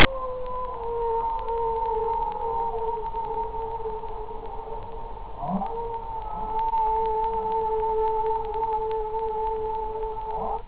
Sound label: HAUNTING, creepy sounds